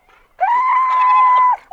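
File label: Antarctic fur seal female call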